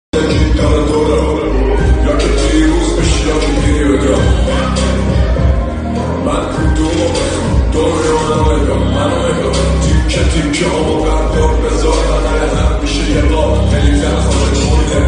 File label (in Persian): ahange vorod be ring